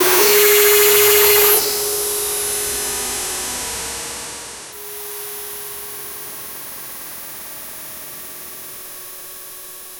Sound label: a scythe slash, melee